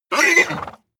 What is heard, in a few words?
horse vocal hit